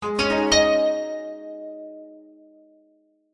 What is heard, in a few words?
multimedia game sound japanese plucked positive tone musical